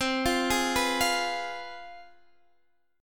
C Major 7th Suspended 4th Sharp 5th, down, Guitar, Standard; CM7sus4#5 arpeggiated; JGuitar